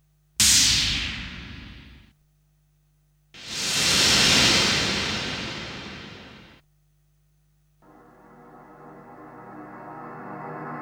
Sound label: Sound Effects; Kniteforce; 1000 Samples Volume 1